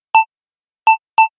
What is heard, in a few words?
square